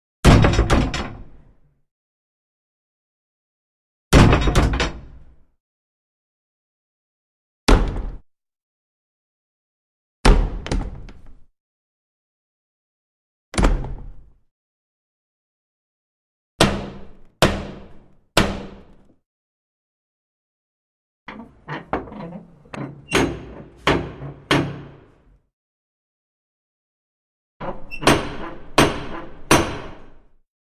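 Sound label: Metal Door Medium Size, knocks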